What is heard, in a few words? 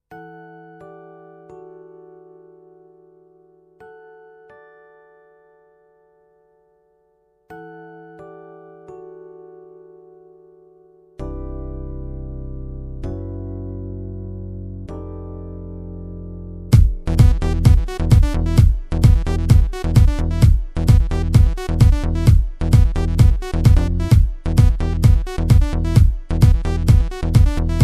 progressive, korg pa55 tr; orjinal demolari